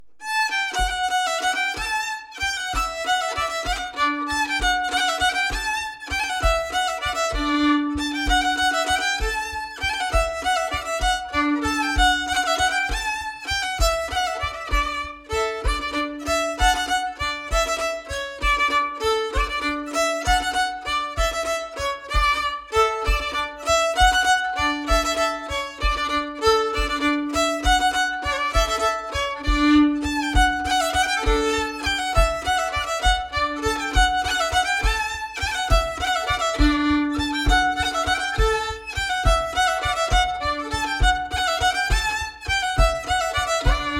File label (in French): Bourree d Auvergne; Bourrees et autres danses antiques publiees par Elie Dupeyrat